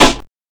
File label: hot acoustic snare f sharp key